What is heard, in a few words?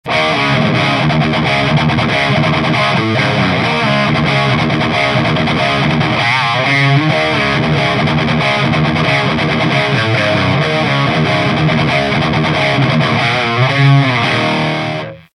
Modded TSL Lead Channel; Dan Hopler